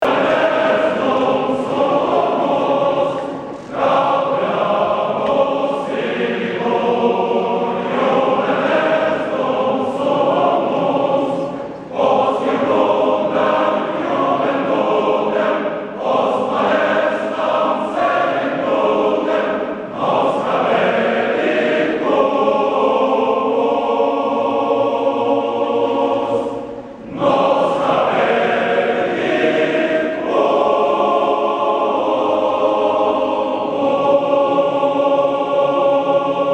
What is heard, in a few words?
gaudeamus, choir